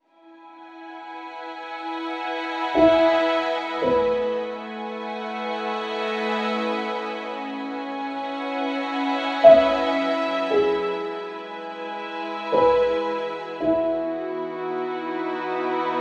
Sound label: Cinematic Ambient Feeling, meditative and sentimenta; soothes the soul